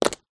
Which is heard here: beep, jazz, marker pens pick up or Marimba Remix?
marker pens pick up